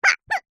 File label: cartoon character vocalisation high pitched fight grunt